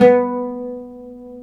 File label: LUTH B3, Arabian Traditions; USB Soundscan vol.43; AKAI